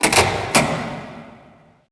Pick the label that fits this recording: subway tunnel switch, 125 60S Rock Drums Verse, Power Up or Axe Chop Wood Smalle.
subway tunnel switch